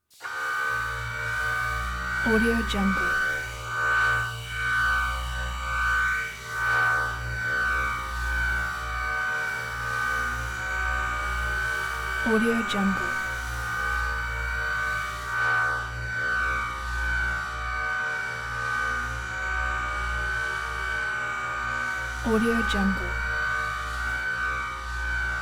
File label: synth; gride